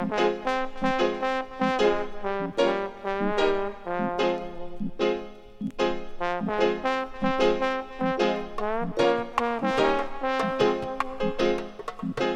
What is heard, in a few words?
melody horns